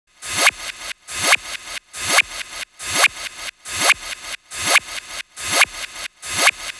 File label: NRG Percussion, Trance